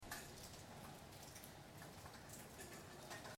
Rain Light Open Window, Light rain pooring of roof from interior apartment point of view; Nice to use as roomtone. Very quiet, subtle.; Rain Sound Effects